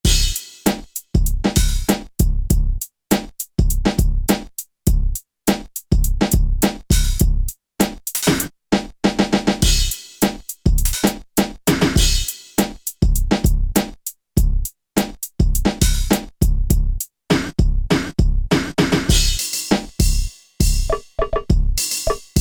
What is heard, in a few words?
Vinyl Kit; Alesis Rap Techno Dance